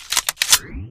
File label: sniper railgun world reload, weapons; tf